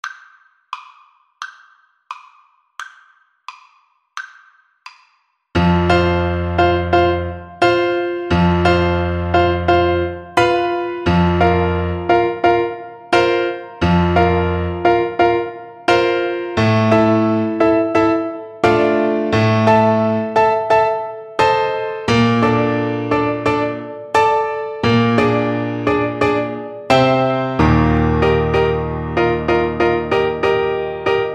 Flute; bartok romanian folkdance 6

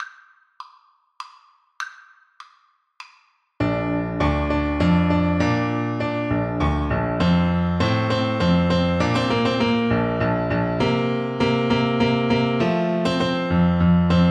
minuet from don giovanni, Saxophone

